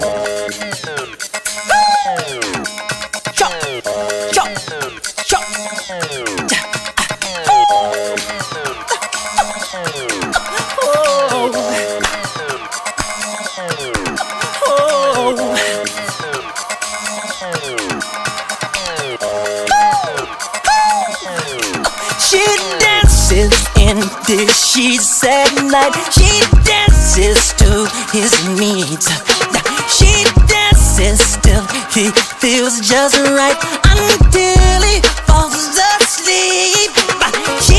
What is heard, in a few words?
jazz